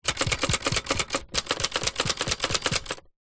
office typewriter typing typebar front close to keys vintage; 1960 lemair helvetia